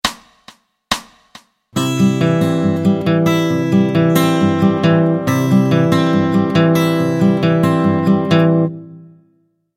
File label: Travis Picking